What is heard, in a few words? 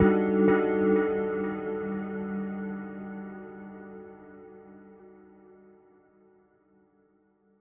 bell echoes d, synth and electronic loops